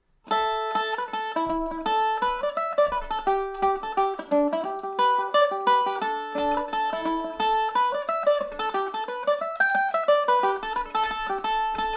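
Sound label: A dorian, Irish mandolin; knotted cord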